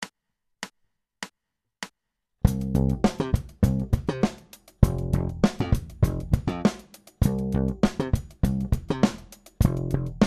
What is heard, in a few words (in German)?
Slap-Grooves